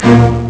violins; Amiga Soundtracker Sample Packs

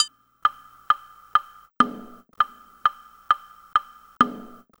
Bellin, Synchro Funk, Beats; Sonic Foundry (Sony Creative Software)

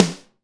DRYSN, ST DRY SNARE

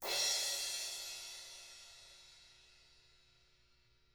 FR L, Drums, BRUSHR

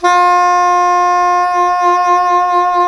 SAX SOP MF, Soprano Long

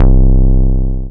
Dance Floor Bass, Multisample Basses

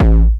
techno 2, bassdrum

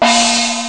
china gong; ST-XX Amiga Soundtracker Sample Packs

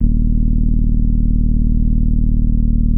SLAP D#1, Bass; E-MU Producer Series Vol. 6 – Analogia Project (CD 1); Boom da Bass 1

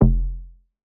Bass F, Bass Synth

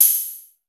NOISE; Analog Odyssey

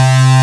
JUP8 PULSE; E-MU Formula 4000 Series Vol. 2

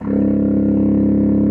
BASSOON C#1; Xsample VOL-6

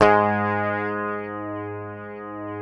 PICKED, Bass, G#2; Sampleheads; Will Lee Bass Library

